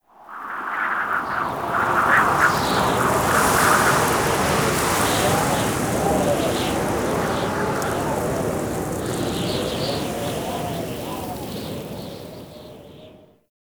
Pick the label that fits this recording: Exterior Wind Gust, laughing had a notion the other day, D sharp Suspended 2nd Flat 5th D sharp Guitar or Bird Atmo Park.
Exterior Wind Gust